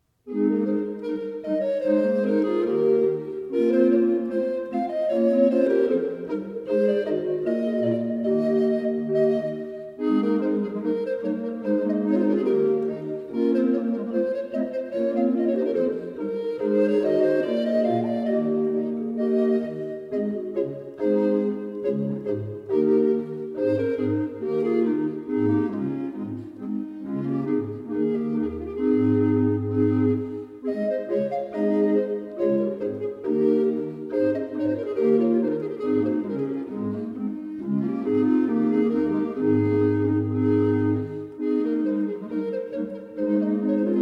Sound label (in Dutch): Allemande uit Suite; Schein; Suite No.15; Banchetto Musicale